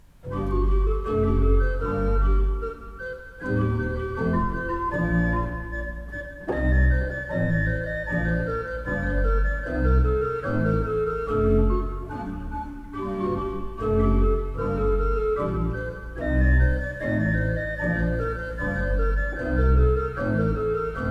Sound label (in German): Breil, gebrauchtorgel